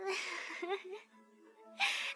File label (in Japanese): "っふふ", 笑い声